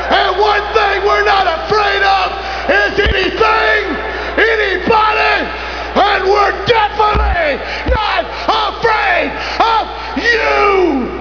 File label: grunge